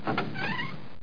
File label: CREAK; landslor